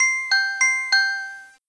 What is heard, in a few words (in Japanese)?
kinkon